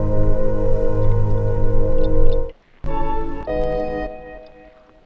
Orch Sounds; Chillin Out; Sonic Foundry (Sony Creative Software); Stylus Pressure Urban Grooves on Digital Wax

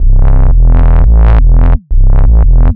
Convoy Bass, Drum n Bass; KJ Sawka; Drum n Bass & Beyond

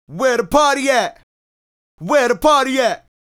Male Solo, Hip-Hop and RnB Vocals

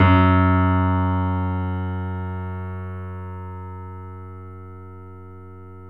F#, Boesendorfer, 3D Pianos

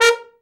BRA TROMB, Trombone; Sound & Vision - Gigapack II CD 1

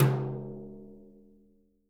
BRUSHES, Living Drums

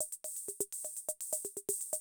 CR78 125, drum loops

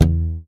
YAMAHA5 MUTE E2, Bass

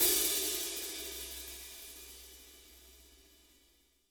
HAT, WARM+FAT KIT; Spectrasonics - Burning Grooves CD2

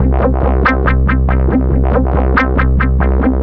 NRG Bass, Trance

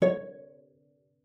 cello pizz C#5; 90 s Sample CDs; Xsample VOL-5

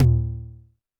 TD-20 Tom A, Roland TD-20 Toms

